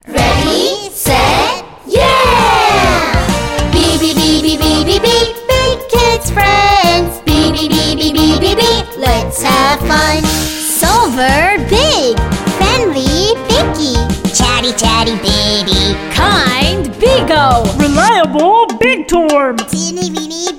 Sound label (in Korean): 영어남, 아동, 노래, 키즈송; 남자; 빅키즈송